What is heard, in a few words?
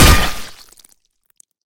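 heavy hit on sword blade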